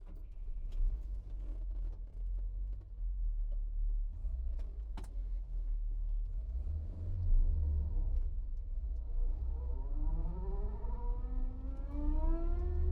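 volvo pv544 sport onboard start fast reverse stop drive stop off interior; Volvo PV544 Sport 1965; DPA4021; Pole Position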